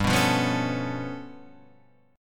G 9th Flat 5th G; G9b5 Chord {3 2 3 4 2 5} strummed

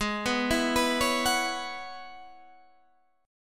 E 6th Add 9th Ab

